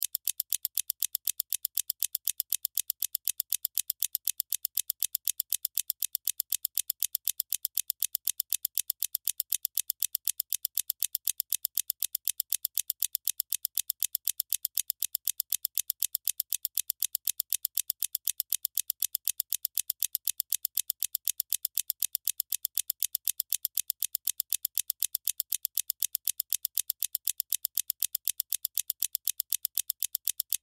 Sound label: Sci Fi Workshop Machines Mechanical Steampunk; Tech; Sci Fi Workshop Machines Mechanical Steampunk Metal Small Movement Inventor Clanks Clicks